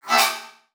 Multimedia Internet CD-Rom Flash Pop Up

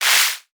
Multimedia Internet CD-Rom Flash Electronic

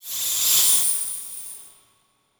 Title Transition Glass Element; Adobe; Production Element

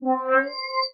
Sci-Fi Electronic Personal Force Field; Science Fiction

